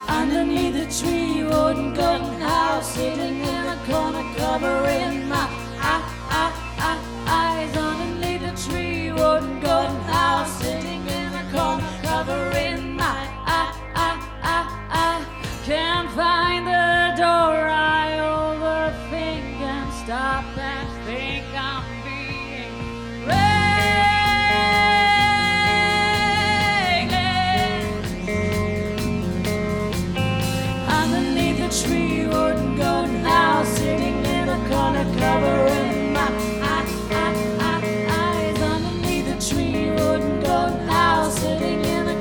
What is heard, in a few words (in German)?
Child dualer Gesang